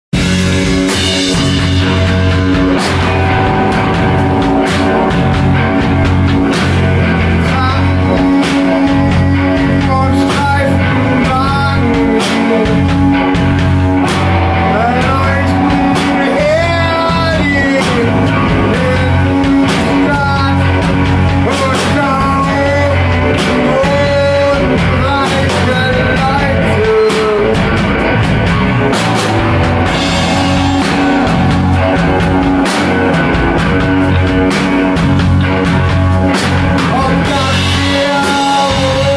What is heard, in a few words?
noise version; ROTTLER ROHWEDDER